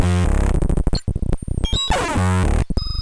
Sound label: Glitch synth break; polygoniix Intro Essentials pt2; Electronic; Glitches